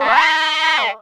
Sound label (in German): Ruf